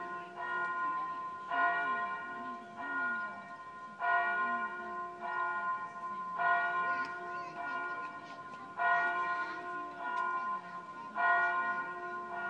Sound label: buckfast bells